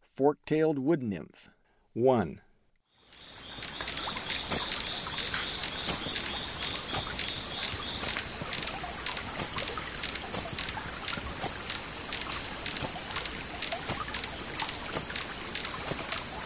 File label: Fork-tailed Woodnymph; S. America; Eastern Lowlands